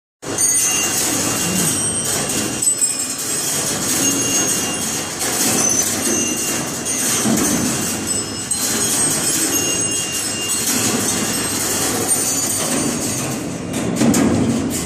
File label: granary